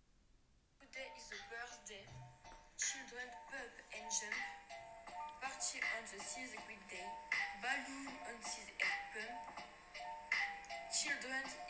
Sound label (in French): rap avec beat